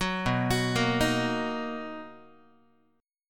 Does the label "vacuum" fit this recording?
No